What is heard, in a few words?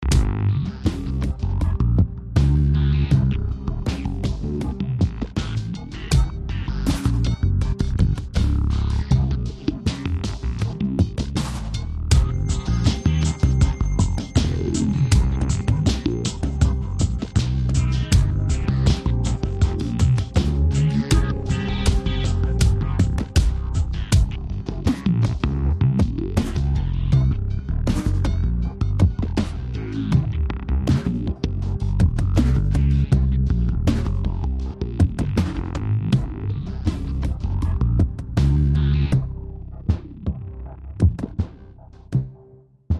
Loop Linear Groove; tales from the borderlands gamerip 2015